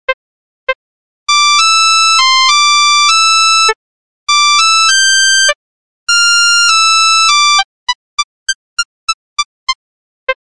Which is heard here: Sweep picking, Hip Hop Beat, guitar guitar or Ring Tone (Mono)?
Ring Tone (Mono)